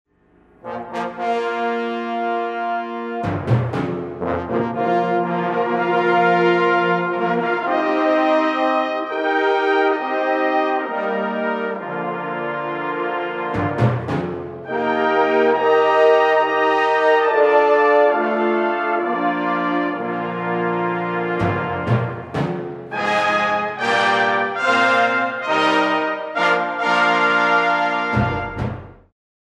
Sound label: Fanfare For The Common Man